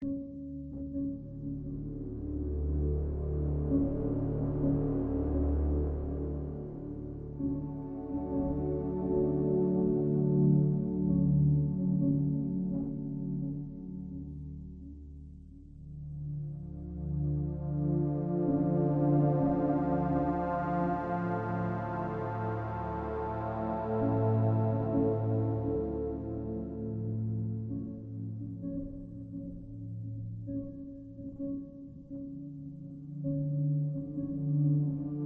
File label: ambient